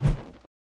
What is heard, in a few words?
Torch Whoosh Panned; torches